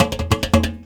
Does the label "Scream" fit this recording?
No